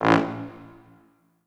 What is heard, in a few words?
A0 L, Orchestral Brass